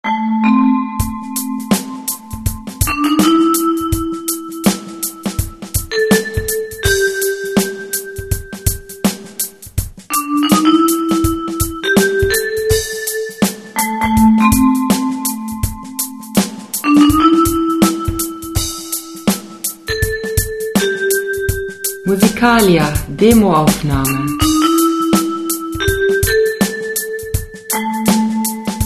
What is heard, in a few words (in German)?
vibraphon